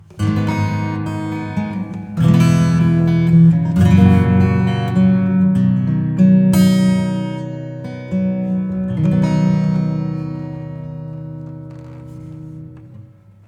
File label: Reverb Acoustic Spring Reverb